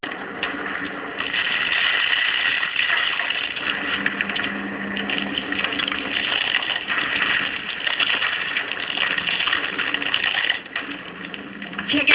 mine, ambience; svencoop; sa13

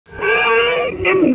wolt attack melee; svencoop; sexr

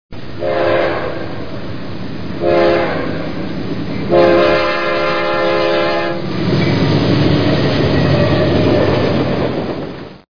train; trainw

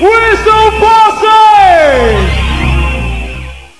whistle posse; headz

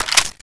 sg552 magin; svencoop

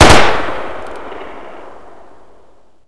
g36e burst; firearms

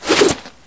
head shield; svencoop; paranoia

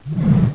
claw miss; svencoop; cryocell

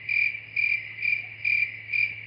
cricket; ambience

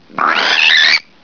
headcrab attack; gearbox

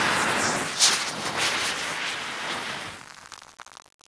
smoke end; firearms

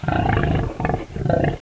voltigore communicate; svencoop

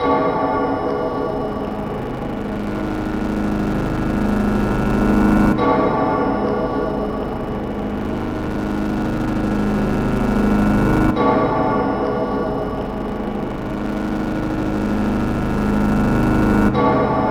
led loop; svencoop

